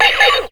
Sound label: tag on opponent